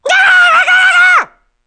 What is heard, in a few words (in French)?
RATOCRI; Mobiclic46